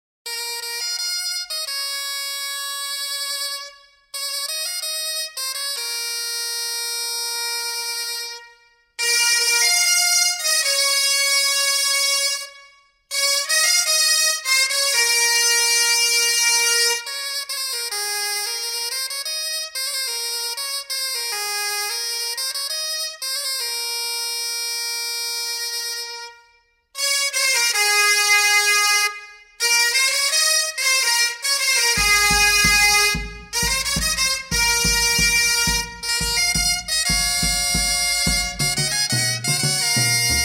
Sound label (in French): Bagad Instruments